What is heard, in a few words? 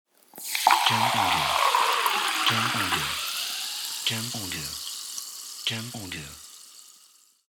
Sparkling Water